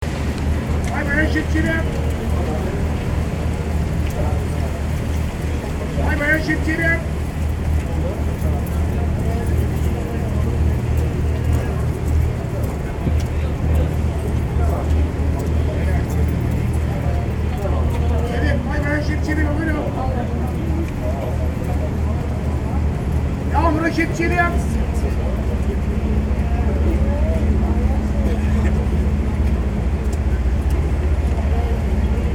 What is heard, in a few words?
rainy seafront soundscape